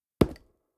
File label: lumber tree